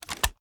reload empty fast start; reloads; iw8; uzulu; gmod

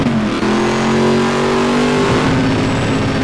third, vehicles

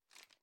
inspect; gmod

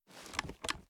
reload rattle; reloads; gmod

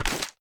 reload empty fast end

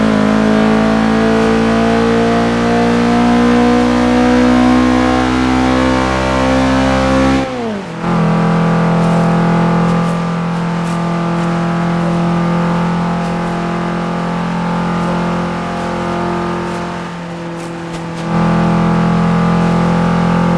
fourth cruise, vehicles; gmod; tdmcars; frs

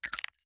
charge reload fast shell eject; gmod

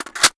reload mag in, reloads; iw8; gmod

